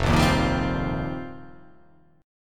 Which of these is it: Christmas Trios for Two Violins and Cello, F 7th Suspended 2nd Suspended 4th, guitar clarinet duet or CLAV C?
F 7th Suspended 2nd Suspended 4th